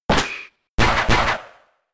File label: Sky Uppercut; attack moves; Pokemon; Gen 4; DPPL HG SS